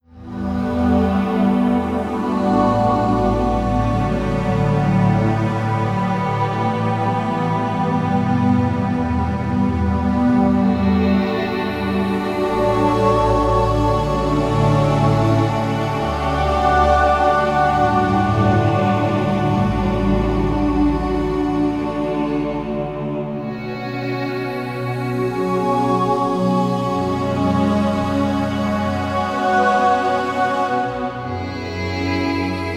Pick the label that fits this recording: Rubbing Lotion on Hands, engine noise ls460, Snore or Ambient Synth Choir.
Ambient Synth Choir